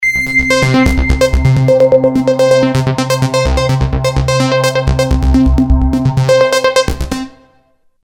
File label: Cyclone ringtone